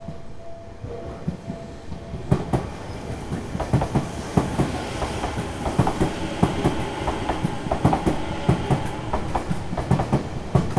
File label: train